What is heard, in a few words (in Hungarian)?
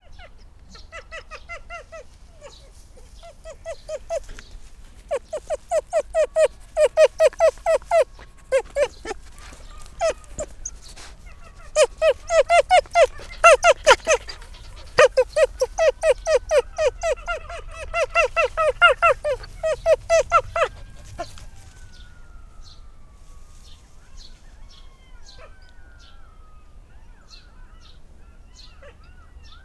izgatott, aranysakal; szegedi zoo 2011